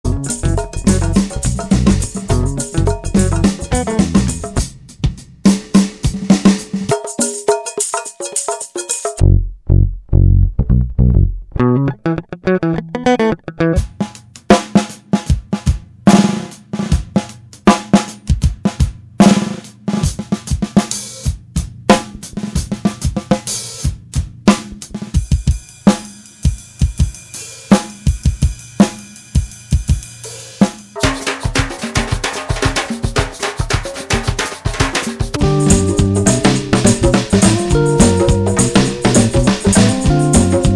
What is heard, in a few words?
new orleans delta loops